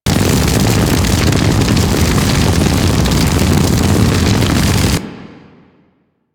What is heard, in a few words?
Fire Beam Loop; Enemies; Abilities; Warframe; Corpus; Arachnoid Camper Terra